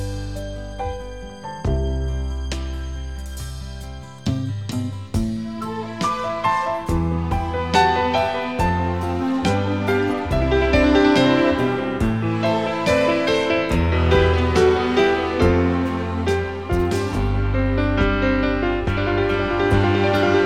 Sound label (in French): Piano et orchestre